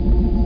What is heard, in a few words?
SHIP INSD; cybrdome